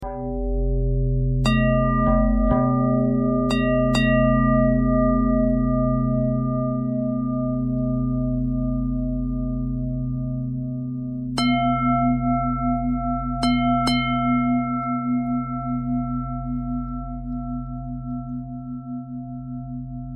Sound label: bell thread bend thread